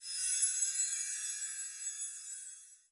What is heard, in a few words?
main screen spot; frozen rush